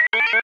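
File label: sphere blips medium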